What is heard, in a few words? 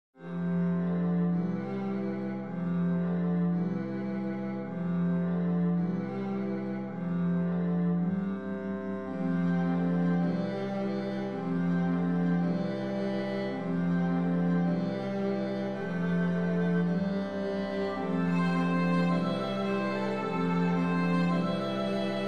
Lullaby, Strings, quartet